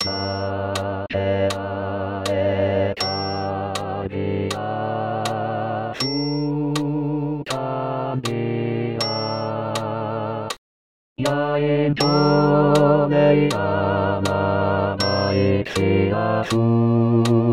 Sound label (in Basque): Bakea eta argia tenor